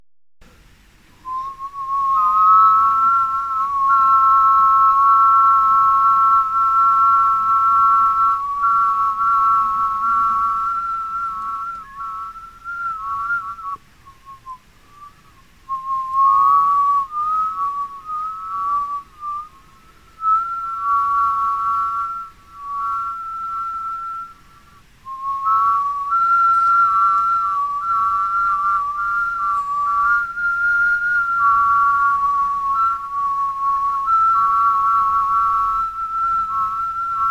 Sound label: triad